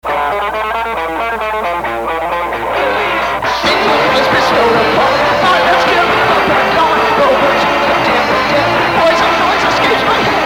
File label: Noise